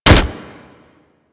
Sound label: Staff Impact Flesh